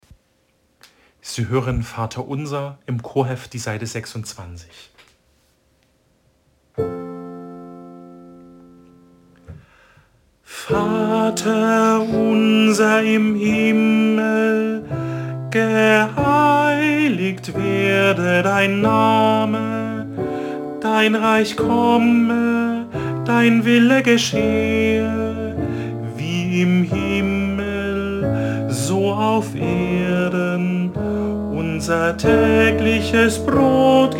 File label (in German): Vater unser unisono